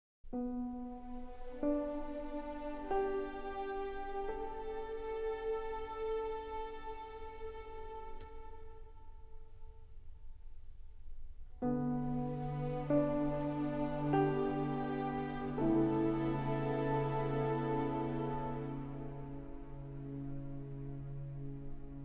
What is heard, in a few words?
Instrumental